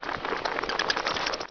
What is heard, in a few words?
BATS